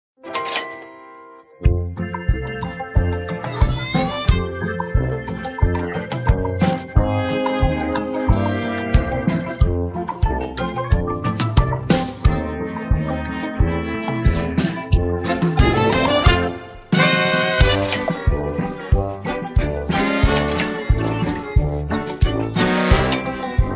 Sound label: Accompaniment Only